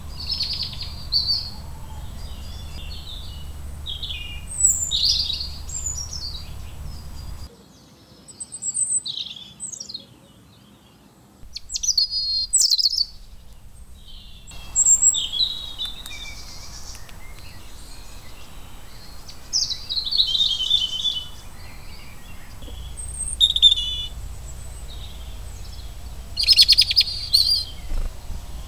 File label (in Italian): canto territoriale pettirosso erithacus rubecula european robin petirrojo europeo; bioacustica